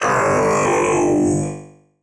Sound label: Golem Death